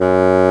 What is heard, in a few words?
bassoon; Roland L-CDX-03 Disk 1; WND Bassoons